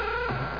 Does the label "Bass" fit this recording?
No